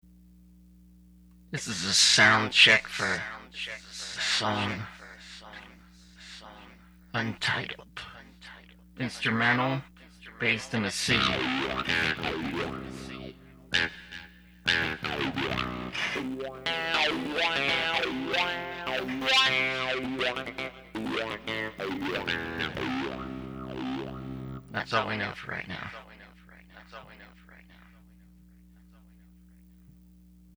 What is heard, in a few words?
Instrumental Soundcheck